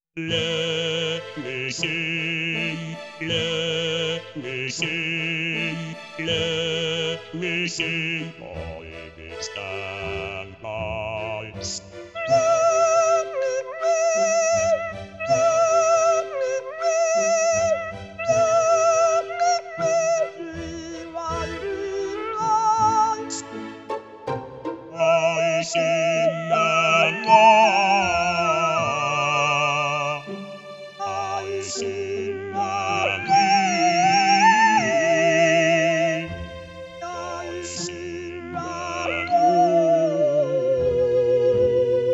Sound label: tutti verse; KTH